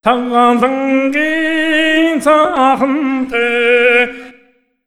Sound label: MONGOL, Asian Traditions; USB Soundscan vol.60; AKAI